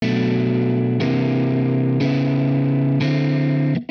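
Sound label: amp guitar chords, Hybrid neural guitar overdrive; honeybee; Morphdrive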